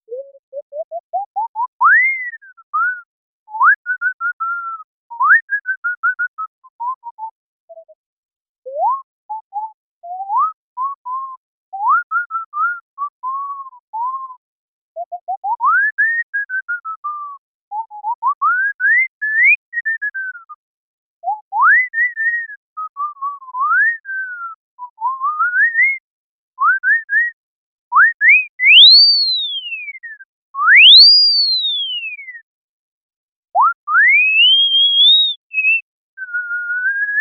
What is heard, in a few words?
Digital fipple flute test with Pd